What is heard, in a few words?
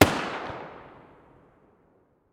muzzle spas12 mono far